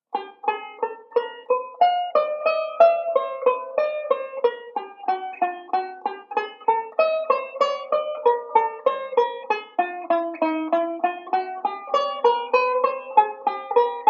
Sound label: slow, chord melody; Beyond Chord Melody Volume II